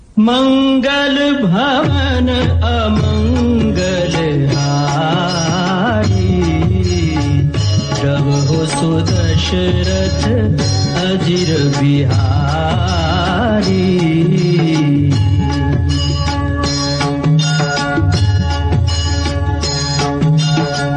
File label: Bhakti Ringtone